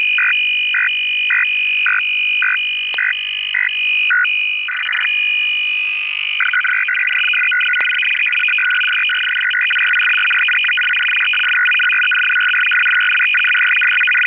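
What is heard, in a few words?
UKRAINIAN MIL. GOVT. 100 Bd DFSK-SYSTEM, idle and traffic; UKR-DFSK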